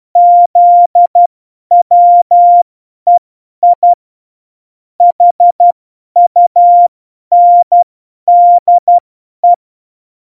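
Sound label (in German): Witz, cw morsen; Morsekurs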